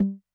Conga Low, Technics U90

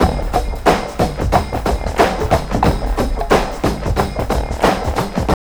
TRIP 90, trip loop

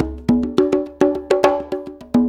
Congas, Perc Loops; Drum Tools

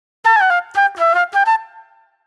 Club Flute; Sonic Foundry (Sony Creative Software); Manhattan Flutes; Super Brown

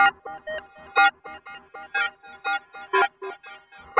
Tape Arp

